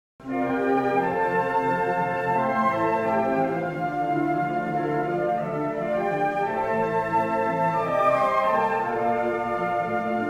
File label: concert, flute; arietta-flute